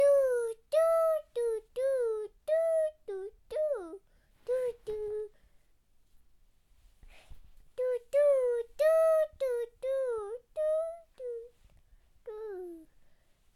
Baby Singing

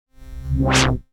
Dry Transition

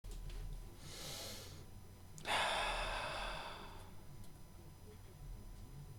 Relief Sigh

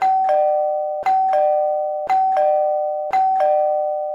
Multiple Doorbell

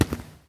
Concrete Footstep

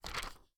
Page Turn

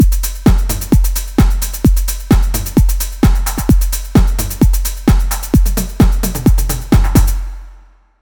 Drum loop

